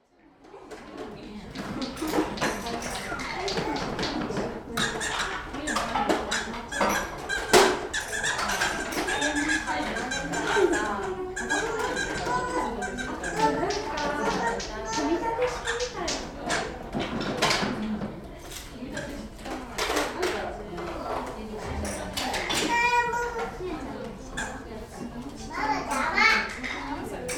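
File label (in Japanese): omochatoshokan, toy library; Chino Cultural Complex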